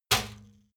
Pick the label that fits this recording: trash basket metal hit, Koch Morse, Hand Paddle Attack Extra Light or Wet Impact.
trash basket metal hit